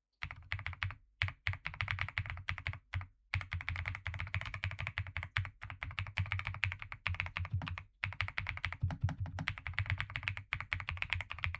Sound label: goose; red square alumix gooseboard goosepad